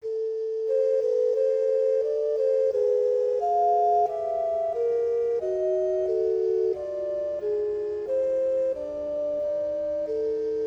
Doppia Fuga

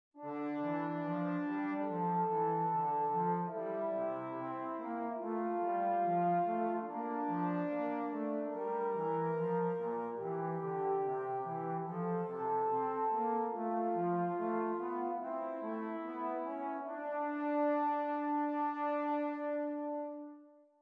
Esercizi di contrappunto a due voci; dritte Gattung; Ronge pagina 59

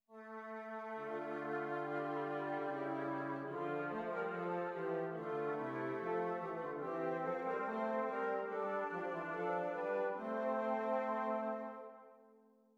esercizi di doppio contrappunto nella decima; Originale Beethoven

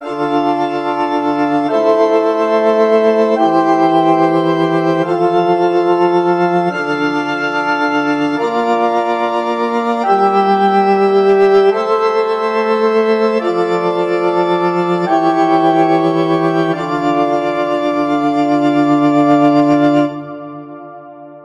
Esercizi di contrappunto a tre voci; Erste Gattung; Ronge pagina 61

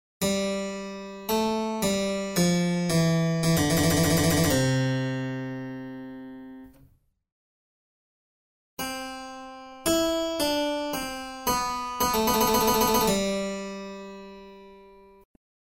Tema e risposta

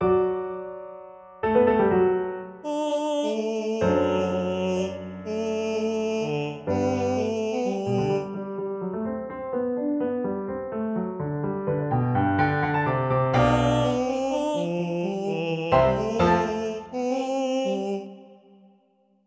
pianoforte

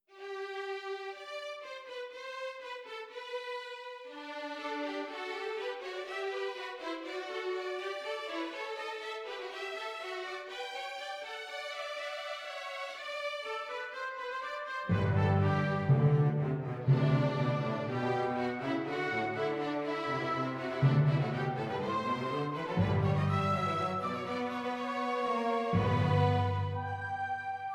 Orchestrazione; Ronge; Gerd Prengel

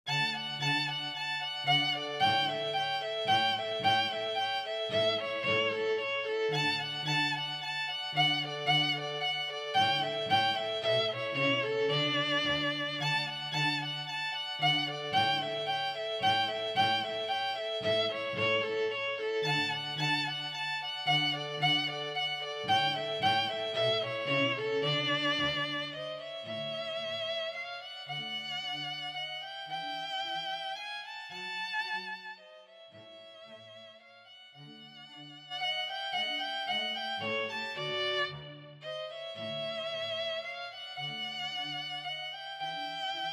Danza in re per 2 Violini e Basso

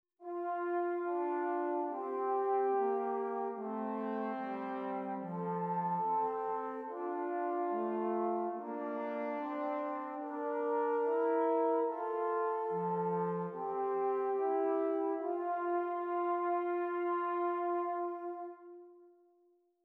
Esercizi di contrappunto a due voci; Zweite Gattung; Nottebohm pagina 49

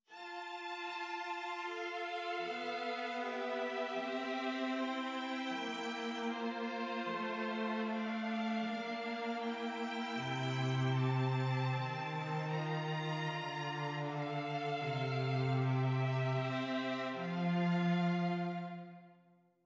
esercizi di contrappunto semplice A tre voci; 3 gattung; Ronge

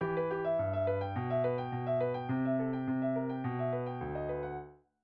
Abbozzo in mi minore per pianoforte; Manoscritto Paris MS58

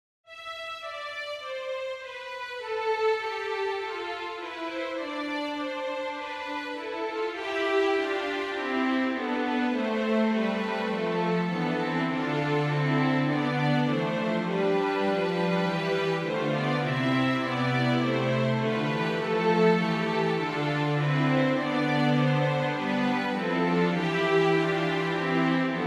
Canone

